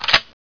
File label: Spas12 Pump forward, weapons